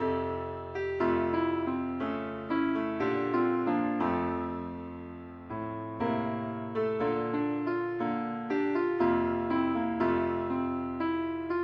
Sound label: keyboard only, key f; pilot